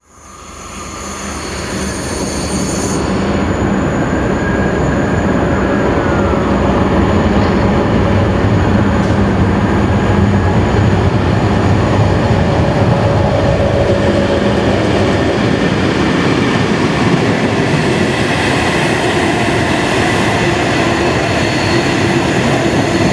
LB MX16 med "Stålormen" gennem Nørrebro.

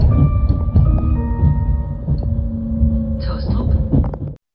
taastrup dsb jingle

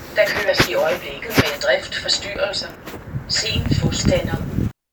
letbane drift

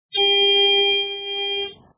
doer brum

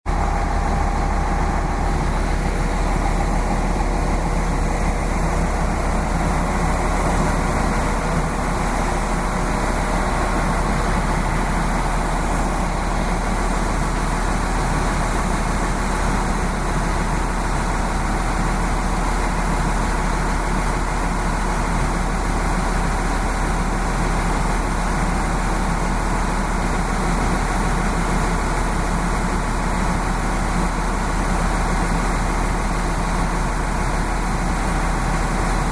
Lint tomgang, Lint togsæt. Tomgang.; Lint 41 tomgang; 19. december 2016, Holbæk Station; Erik F. Andersen

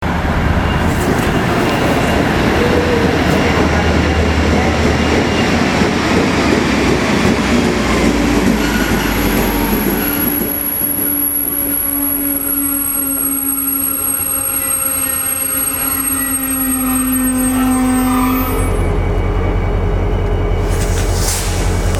bremser

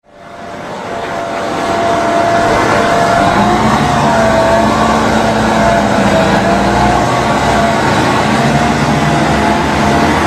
ic4 motor, toglyde

